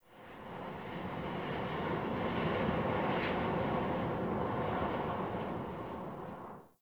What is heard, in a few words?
Under City Wind Heavy Slow Gust